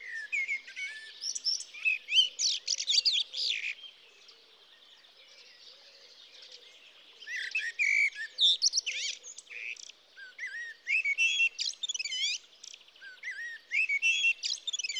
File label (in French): with energy merle noir; TOULON; PRESQUILE